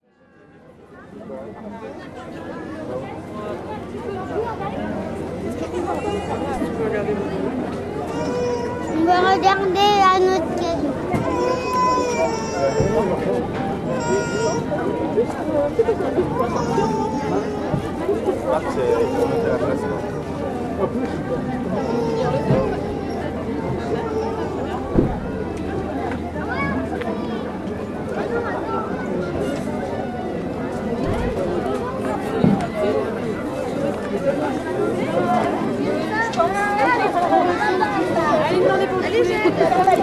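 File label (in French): Marché d Ambert; MZ-N710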